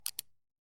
Cowboy Revolver Cocking; SoundsCrate

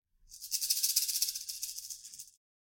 rattle snake; soundscrate

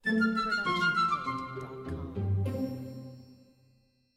Animated Ident; SoundsCrate

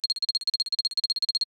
digital typing; SoundsCrate

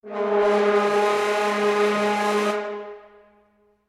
fluttle horn

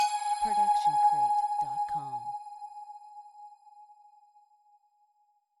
tonal blip; soundscrate

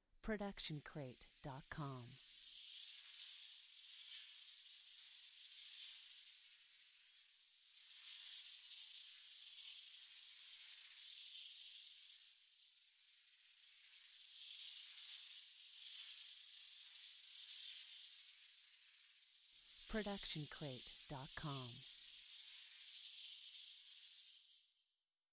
Magic Portal; SoundsCrate

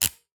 old camera sounds; soundscrate

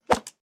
arrow swoosh

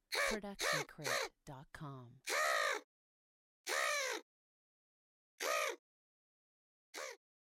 miscellaneous crow noises; soundscrate

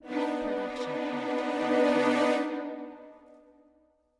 dramatic tremolo; SoundsCrate

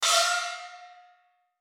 funny gong

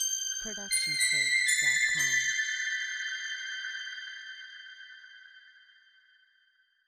christmas bells jingle; soundscrate

